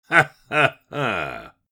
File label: Elderly man laughing